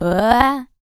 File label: FEM. HUMAN, Vocal, R&B & Dance; ILIO; Vocal Planet VOL-4